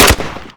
aug fire; STALZONE

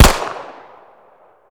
gsh18 fire; STALZONE

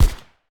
aug a3 silencer; STALZONE

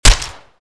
mp5sd fire; STALZONE

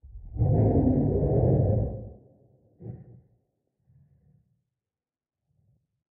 Small ambient fog; STALZONE

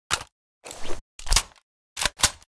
ump45 reload; STALZONE

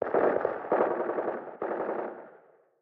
Small ambient shooting; STALZONE

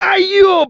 bandit hit; STALZONE; customnpcs; stalker

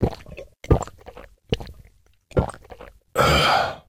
drinking; STALZONE

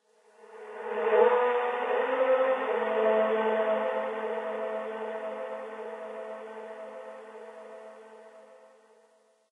strange sound; STALZONE; minecraft

